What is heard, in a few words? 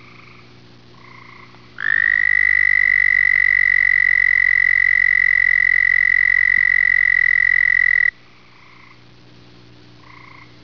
b. americanus, frogs